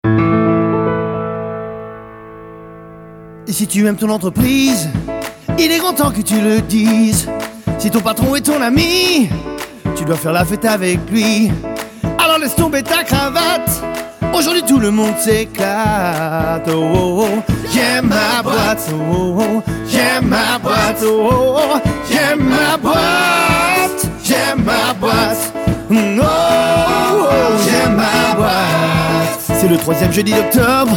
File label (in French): Gospel